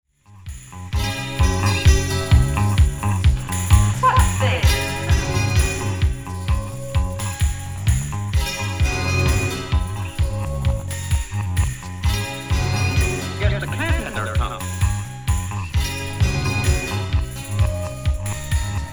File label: anvil